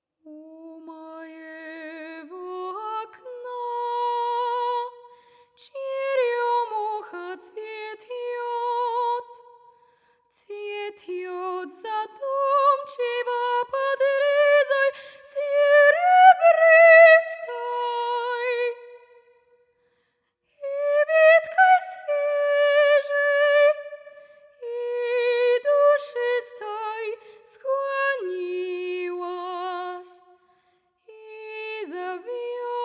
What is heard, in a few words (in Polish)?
Wydział wokalny; szkoła II st